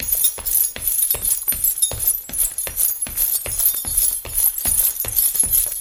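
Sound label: sounds of chains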